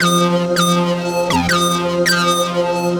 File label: Brighter Shade, Synth Sequences